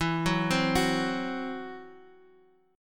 E Suspended 2nd Sharp 5th E, Guitar, 4F,4A,5C,5G,5C,6E; arpeggiated; Esus2#5; 11,9,12,11,x,x